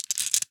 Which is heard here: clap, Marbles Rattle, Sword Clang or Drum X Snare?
Marbles Rattle